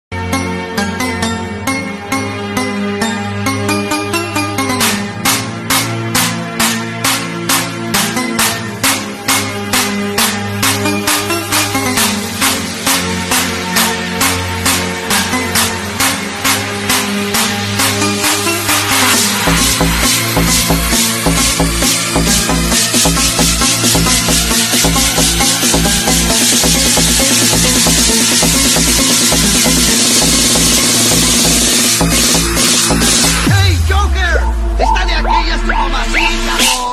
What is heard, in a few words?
Mobile Ringtone